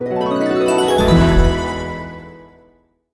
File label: medium Win; magic maid cafe